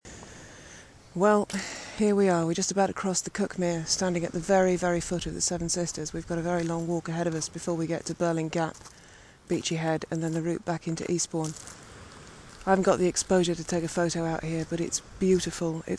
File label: nightwalk